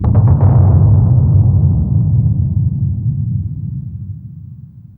THUNDER FUZZ, Impacts, Hits & Booms; Distored Reality

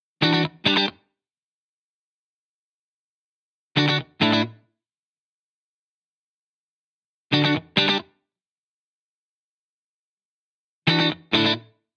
Guitar Bpm135 Key D, 70s Guitars

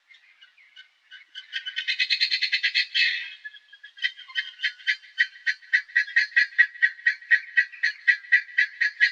Birds Various, Birds

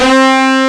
ATT BRASS; AKAI S-Series CD-ROM Sound Library VOL-8

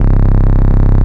bass, Drum & Bass, 160 BPM; Ueberschall; Drum & Bass Resonance

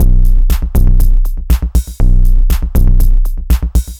Subtractive Beat

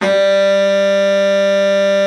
BARITON, Baritone sax; Best Service; Killer Horns

